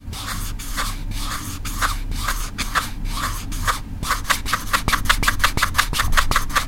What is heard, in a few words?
scratching a small tictac box